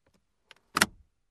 Buckle up

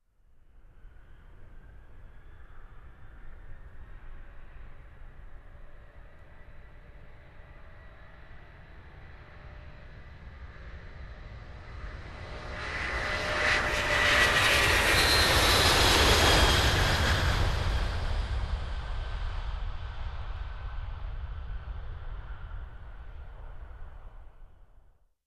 Jet aircraft approach fly over

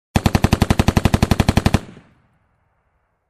Machine gun firing at target

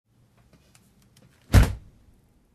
Closing compartment

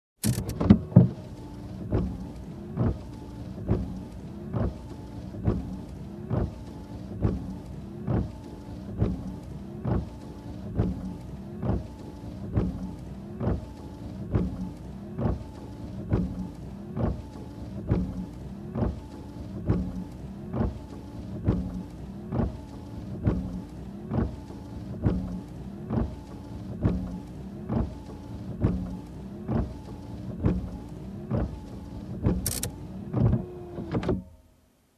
Windshield Wiper

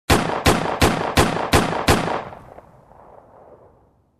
Multiple pistol shots

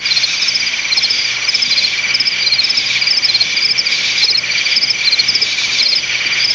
Crickets in the night

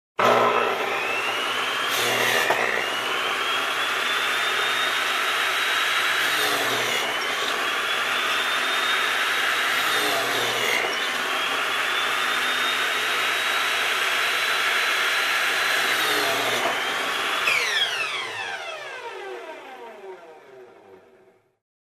Construction Drill

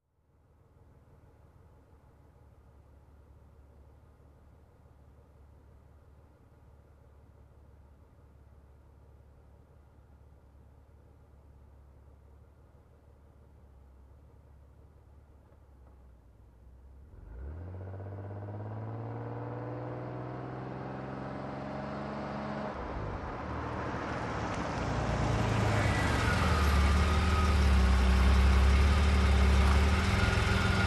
Car parking

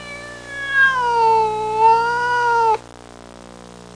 Long drawn meow

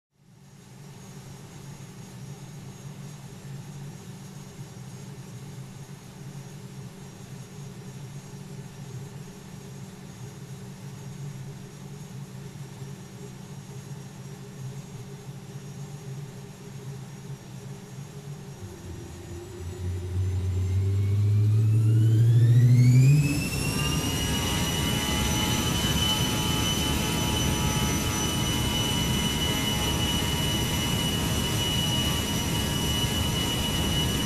Airplane Take off Sequence

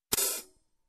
Open Hi Hat

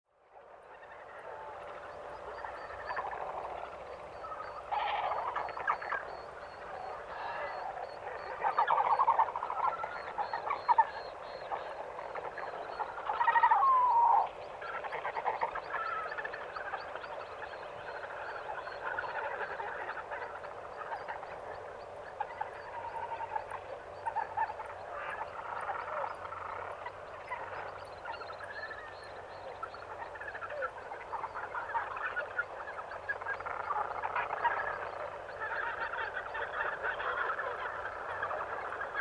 Many Bird calls in distance

